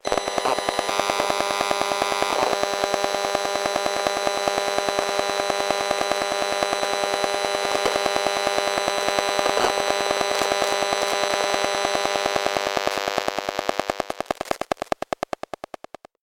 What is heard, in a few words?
Typical Indoor Mix